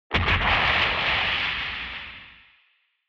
Retro Anime Robot Low Long

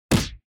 Hit Block High

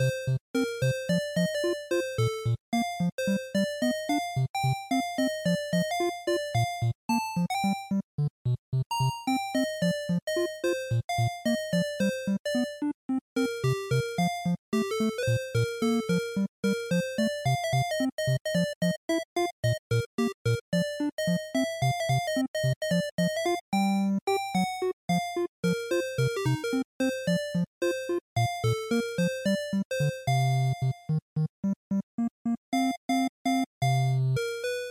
General Stage Loop, bgm

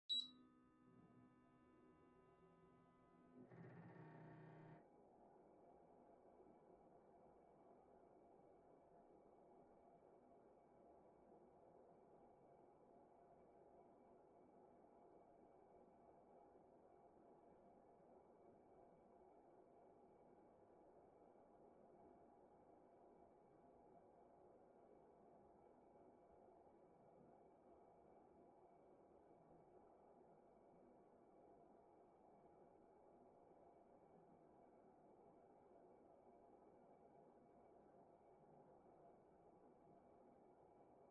Air Purifier Far Low

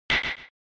Baseball Wood Bat; SNES

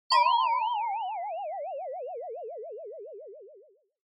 Negative Low Long; Onoma; オノマトペ ネガティブ; 低 長

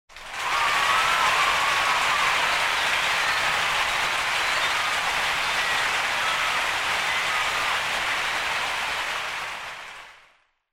Cheer Crowd High Long

